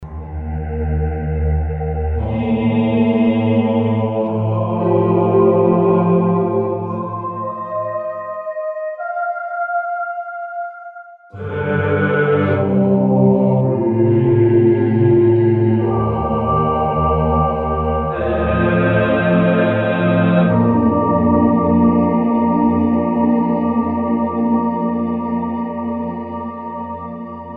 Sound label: Adagio in Bb, Goth Rock